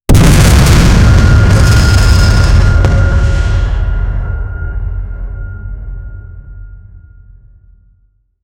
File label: artillery